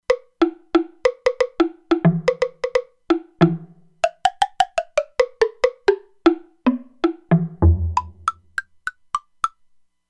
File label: Temple Block, E-mu Proteus-2; Factory Presets